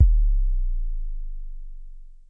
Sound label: low bass long, Roland SH09, drum machines